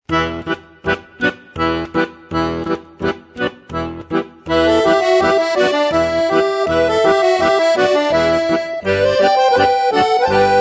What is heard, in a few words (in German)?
Rumänien, Accordion; Siriul